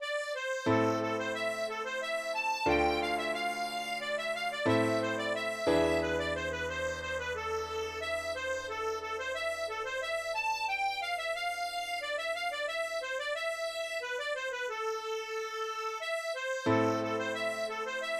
fandango accordeon; betelu

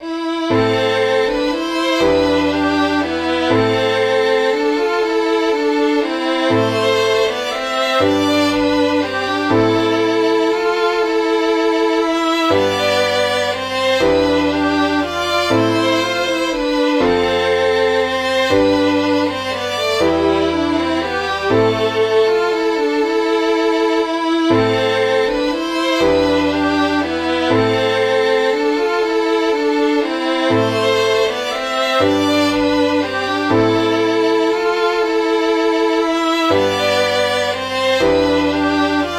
waltz

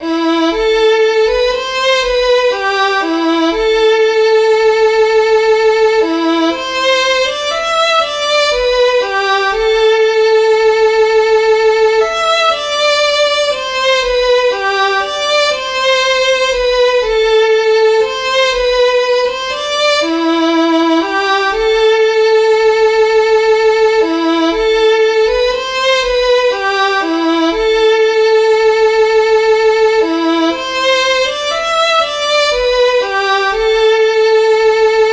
waltz violon1

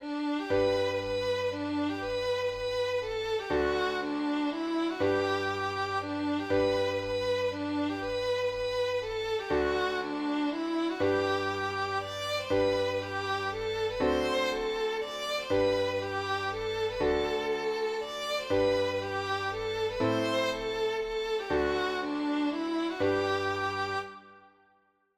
mazurka

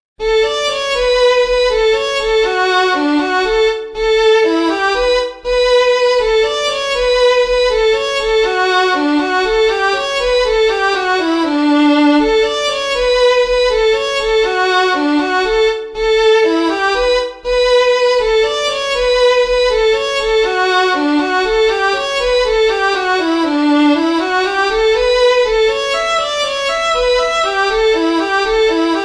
mazurka

